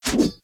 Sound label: sword swing